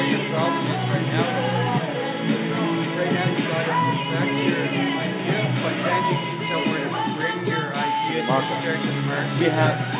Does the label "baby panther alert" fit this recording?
No